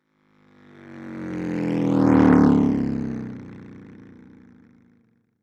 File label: Motorbike passing by distant perspective; vehicle